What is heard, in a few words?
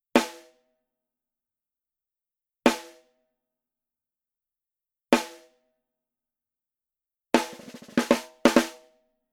SNARE Rim Shot; Genera